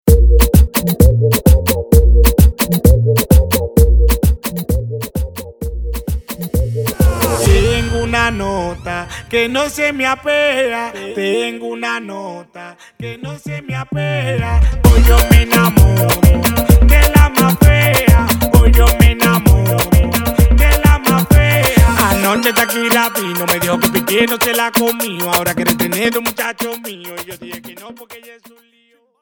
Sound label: Intro Chorus 130BPM